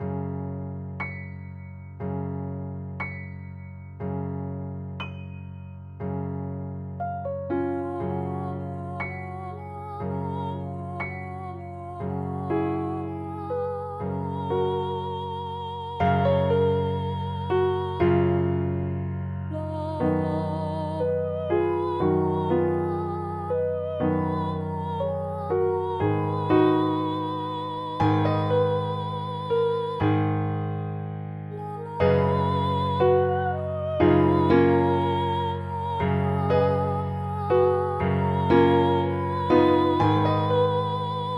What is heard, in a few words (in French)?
pour voix haute et piano; carriere jean paul; la cloche du soir